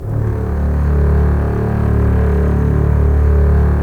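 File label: STR CBS, Strings; Roland - LA Composer vol. 4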